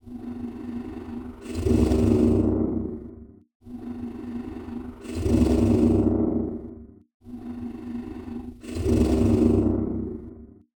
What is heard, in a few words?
breathing loop